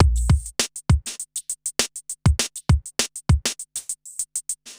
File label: Smooth, R&B Drums